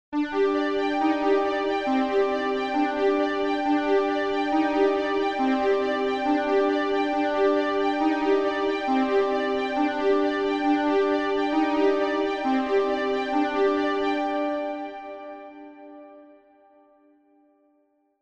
string cluster; welson; stringer

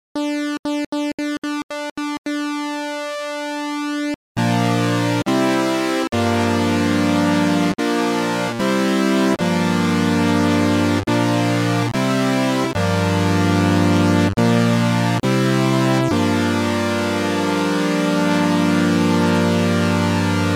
free running osc; obx

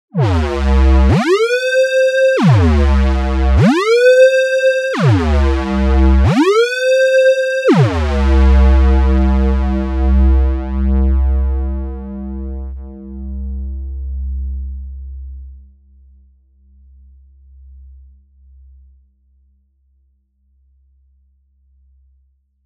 tuned portamento; obx; vst audio; opxvst

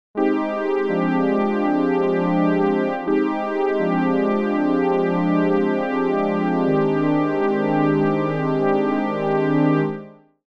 stereo brass; stringer

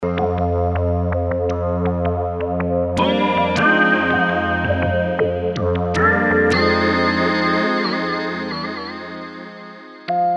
opx perc impro

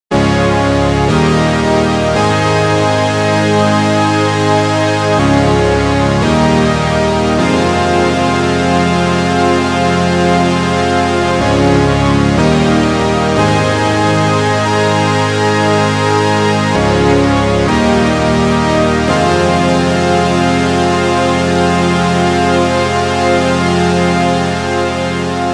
octave string machine

